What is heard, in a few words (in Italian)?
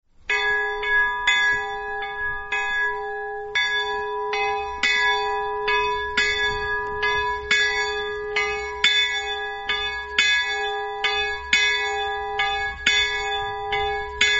campane; slorenzo